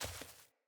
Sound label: Sandals Walk Dirt